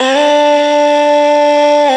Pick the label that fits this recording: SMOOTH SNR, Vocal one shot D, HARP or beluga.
Vocal one shot D